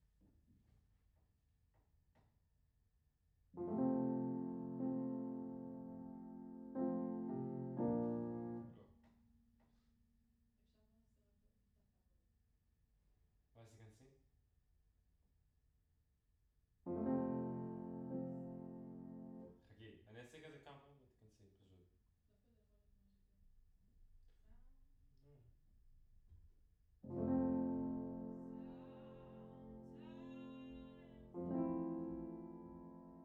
Piano Room

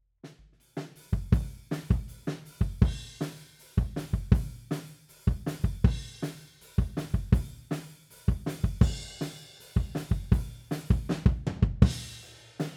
Kick Out, 160 BPM

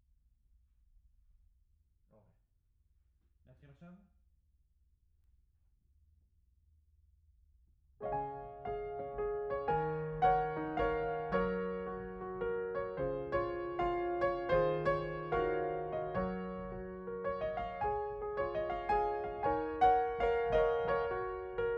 Piano Mono

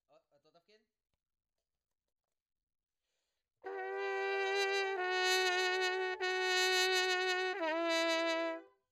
Trumpet

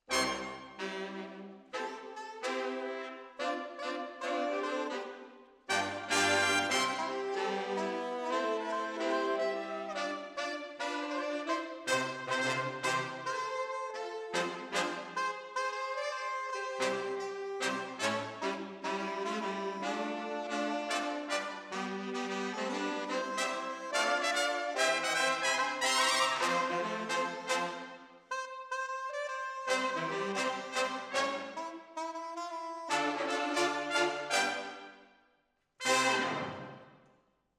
Decca, Jazz for piano

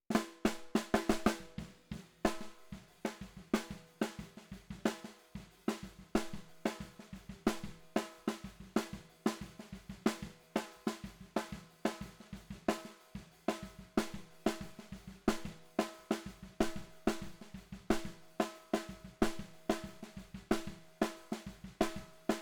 SNR Bot

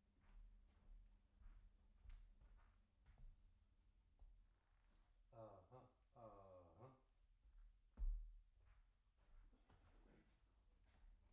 BARI SAX D

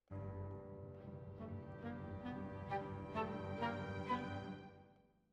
Flute

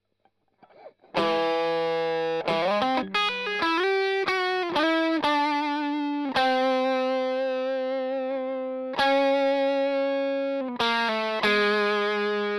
Strum Line

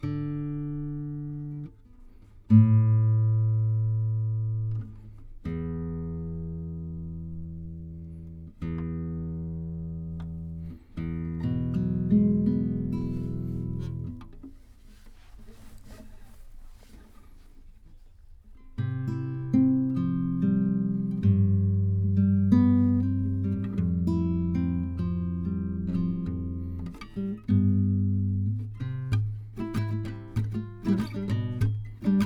GTR

